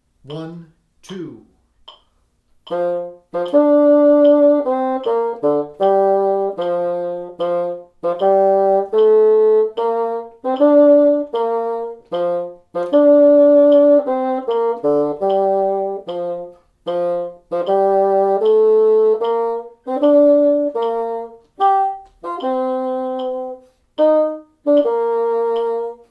Polish Bassoon; Part 1; Rubank Elementary

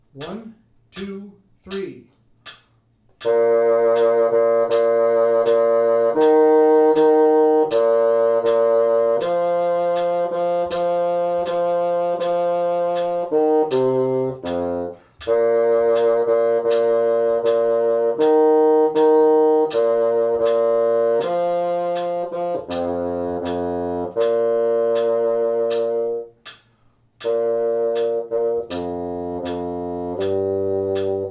Alma Bassoon 2 80; Part 3; Rubank Elementary

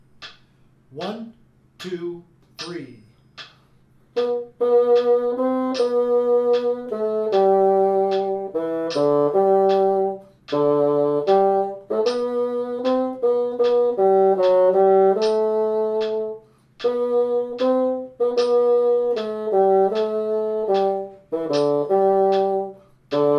Carry Bassoon 1 76; Part 1; Rubank Elementary

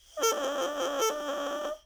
Crow, bassoon reed; The width of the reed at the collar was 0.34 in (8.64 mm). The reed had a string wrap.; Ewell

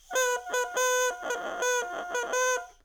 Crow, bassoon reed; The reed had a string wrap. The number 39 appears on the barrel between the first and second wires.; Ewell

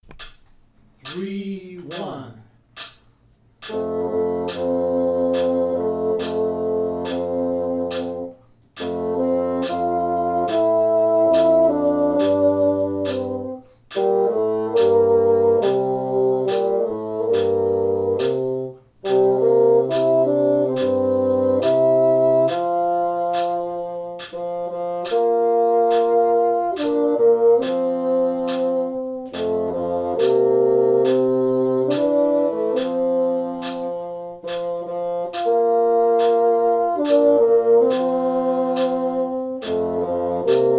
Lullaby Bassoon 1 and 2 70; Parts 1 and 3; Rubank Elementary

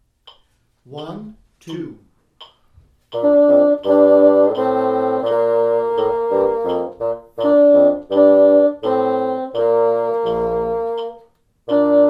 German Bassoon 1 and 2 84; Parts 1 and 3; "German Folk Song"; Rubank Elementary

